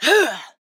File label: Grunt Attack